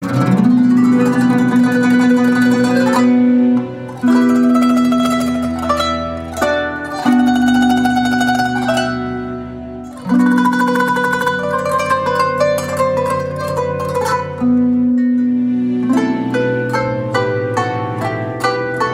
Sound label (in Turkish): Kanun taksimi